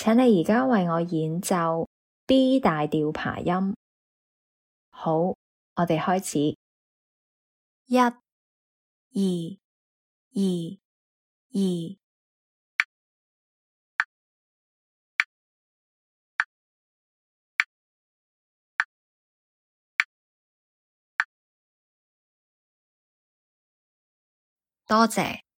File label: b maj arp